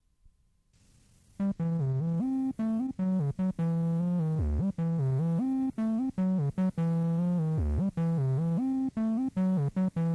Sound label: Rain Drop